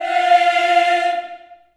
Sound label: F4, Choir